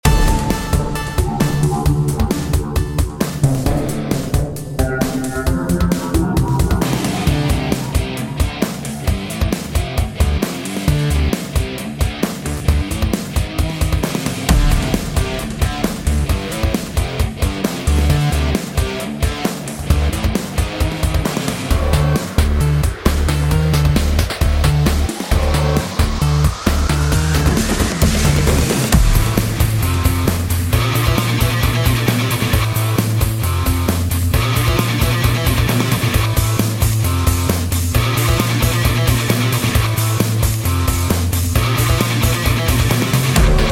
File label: Roaring Lava; Soul Knight